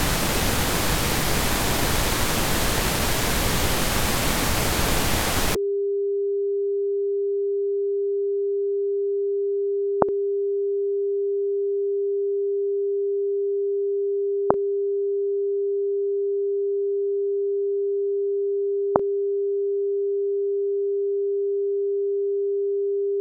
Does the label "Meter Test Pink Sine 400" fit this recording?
Yes